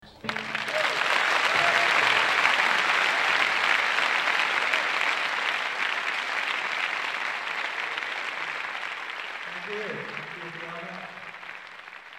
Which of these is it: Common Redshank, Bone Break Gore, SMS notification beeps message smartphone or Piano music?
Piano music